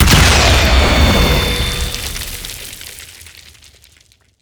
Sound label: Orbital Strike Explosion; Warframe; Veilbreaker; Kahl Abilities